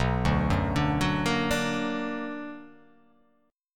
B Minor Major 7th

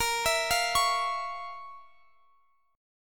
F Major 7th Suspended 4th Sharp 5th Bb

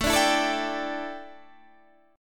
A 7th Suspended 4th A, chord; A7sus4 strummed

